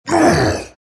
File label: morph pain; terrorblade